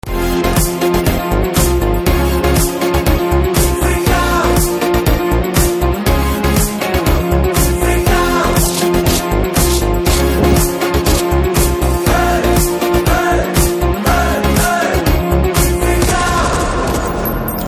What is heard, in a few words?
Telugu Ringtones and BGM; Disco Raja